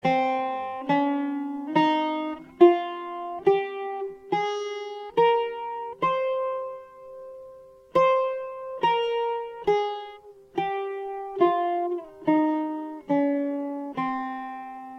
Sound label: maqam bayati C Buzuq